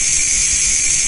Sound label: lawnmower